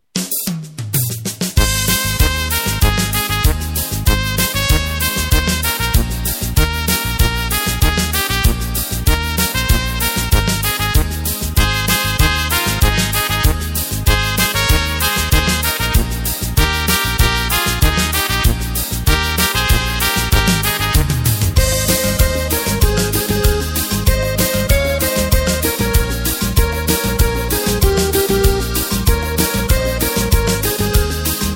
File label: Samba